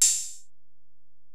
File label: HiHat, Alesis DM-PRO